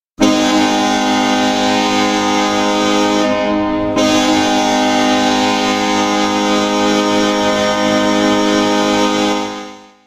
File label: Horn Long; Penguins